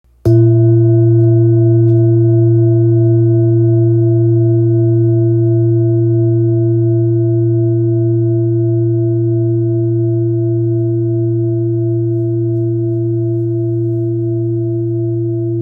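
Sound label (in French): Bol therapeute la diese, bol chantant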